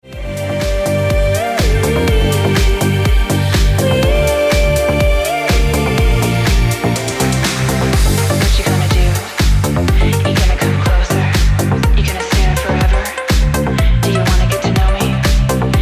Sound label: Ringtone Deep House Music